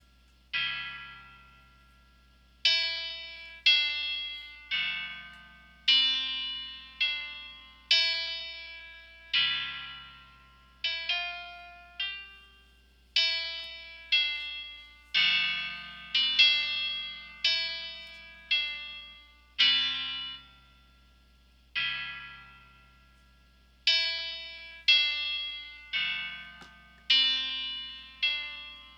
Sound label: piano mashup; beats